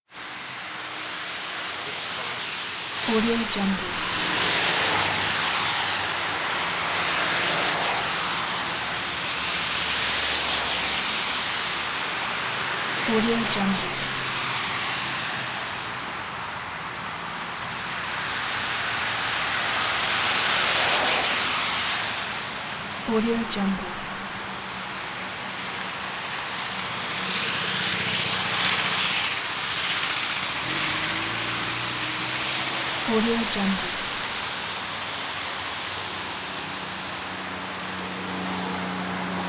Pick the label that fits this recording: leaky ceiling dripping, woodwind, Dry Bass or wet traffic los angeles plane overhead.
wet traffic los angeles plane overhead